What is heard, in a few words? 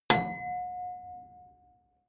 metal impact girder